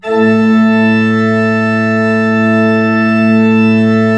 A1, Cathedral Organ, MANUAL PLENO; Propeller Island

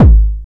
Bloated 909, Kick Drums; Zero-G; Soundclash

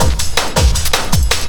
Drum & Bass, 160 BPM

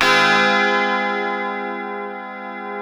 F#3 C funk chord, guitars; Spectrasonics; Hans Zimmer Guitars VOL-1; 90's Sample CDs

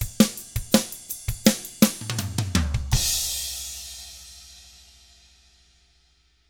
ROCK, Drums; ILIO; Double Platinum Drums 1; 164 ROCK

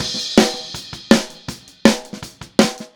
Snare, Drums; Take Your Toms Off

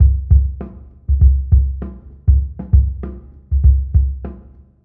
Antimatter Perc, Processed Drumkits

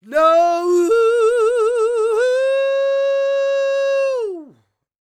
SOUL, Vocal Planet, Blues & Soul; CW SOUL

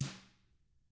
TAMA, Drums; ILIO; Double Platinum Drums 1

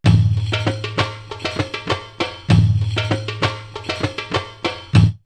Sandy Saudi 98, drumloops World Ethnic; X-Static Goldmine

